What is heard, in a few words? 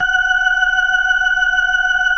F#5F, B3, Vintage Keyboards